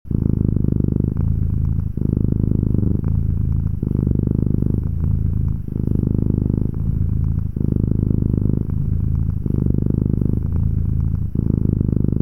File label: Cat Purring Slow & Warm; Loopable